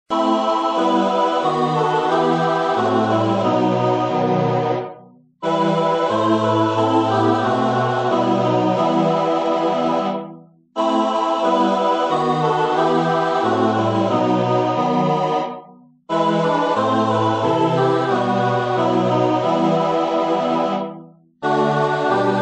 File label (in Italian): solo musica